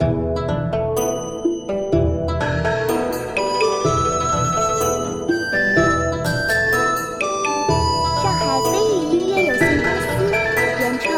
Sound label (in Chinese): 轻松欢快俏皮; 豆子世界; 斗地主